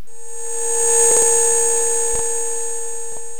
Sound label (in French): Ovni; DoodleJump